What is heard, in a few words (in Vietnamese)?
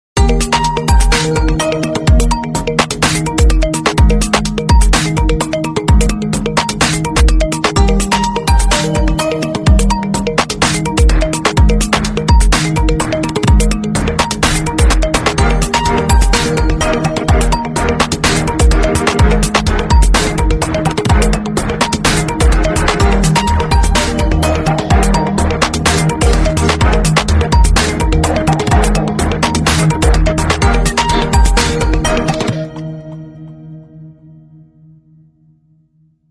khong loi oppo a58 4g official